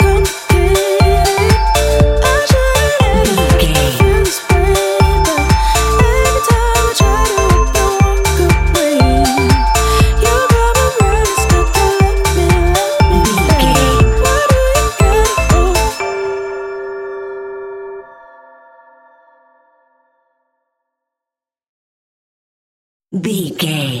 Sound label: A fast paced piece of club music; A fast paced piece of club music perfect for the nightclub, and a youth orientated visual scene.